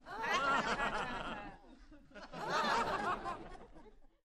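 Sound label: Crowd 50 Short Subdued, small interior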